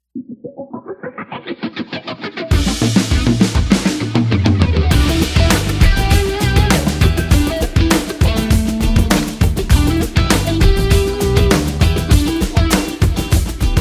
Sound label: Miami Vibe